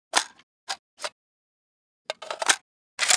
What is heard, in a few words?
barrel load, weapons foley; Spearhead Demo; npc